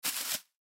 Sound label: Footsteps Hard Sole Shoes Single Step Right On Leaves; Foley; Footstep Sound Effects